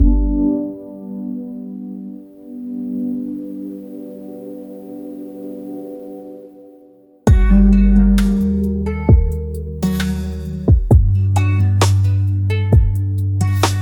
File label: campfire cozy